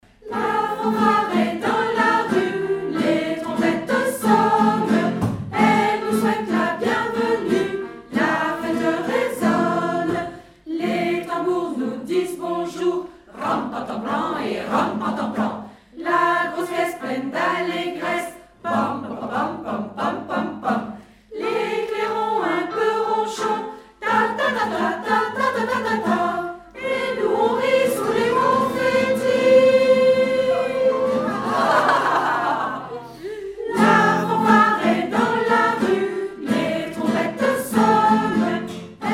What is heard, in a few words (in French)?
La fanfare